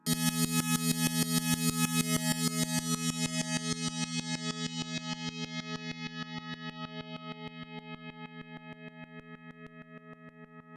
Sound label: SYNTH